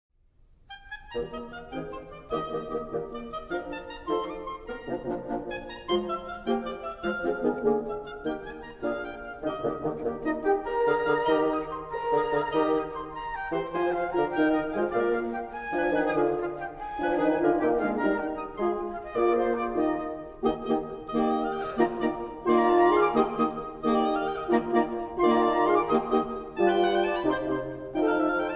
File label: Wind Quintet; Danzi